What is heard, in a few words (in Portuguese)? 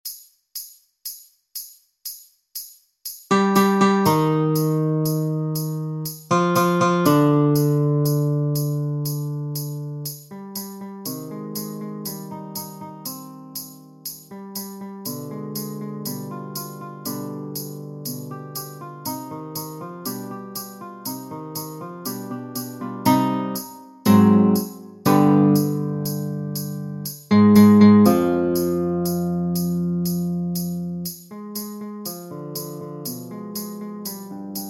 Sinfonia Movimento 1 violões base, Beethoven; Sinfonia5